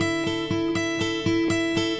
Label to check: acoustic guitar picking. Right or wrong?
Right